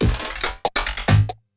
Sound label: LOOP, 140 BPM, Drum & Bass; Ueberschall; Drum & Bass Resonance